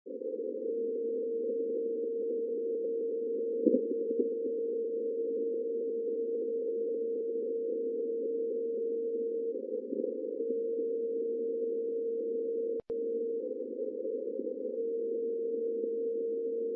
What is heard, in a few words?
time station Germany; DCF48